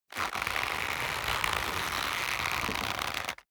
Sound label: leather creak long medium